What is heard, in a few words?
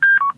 xmit off